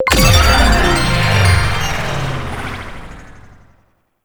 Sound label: Moto Race Menu Start Race; Warframe; Motorcycle Racing